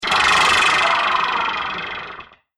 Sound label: Laser Dribbles; Laser Sound Sound Effects